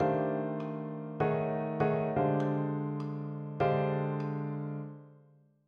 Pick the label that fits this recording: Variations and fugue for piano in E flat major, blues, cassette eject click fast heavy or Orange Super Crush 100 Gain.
blues